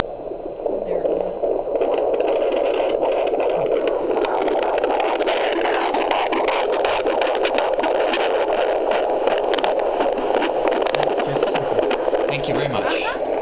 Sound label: baby